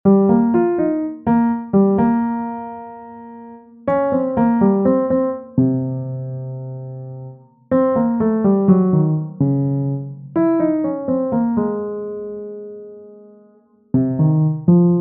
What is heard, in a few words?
bossa nova